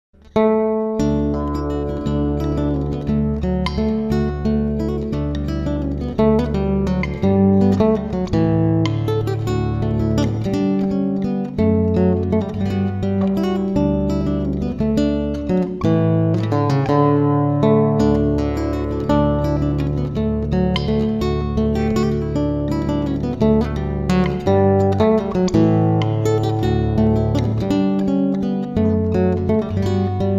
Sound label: classical